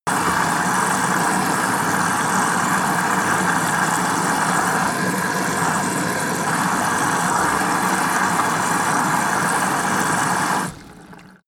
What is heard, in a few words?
washing machine water flow in